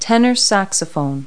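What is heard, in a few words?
tenor saxophone; Multi-Lingual Talking Picture Dictionary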